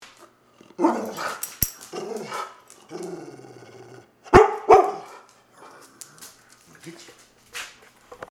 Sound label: Dog Barking